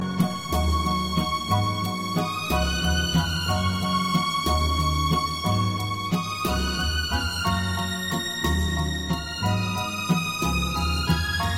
sweet violin

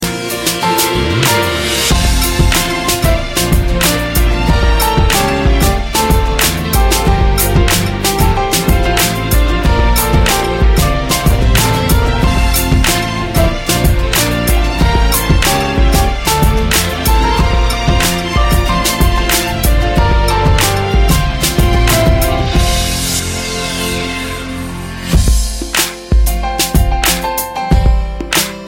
super tone

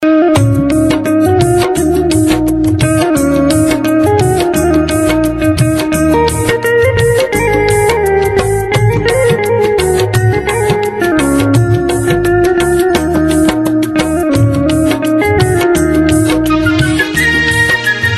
old hit song

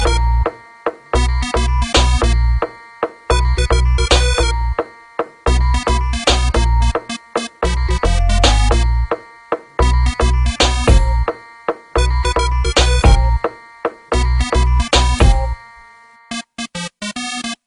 dub raggamuffin

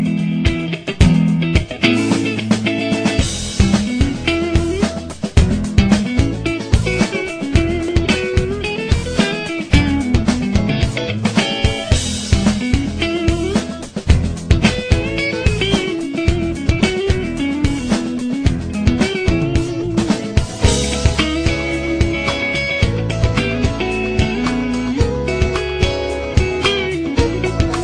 funk

